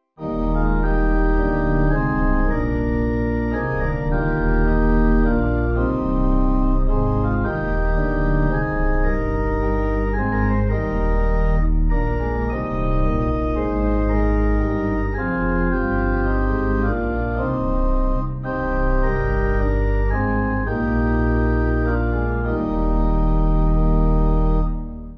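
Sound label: Pipe, Pipe Organ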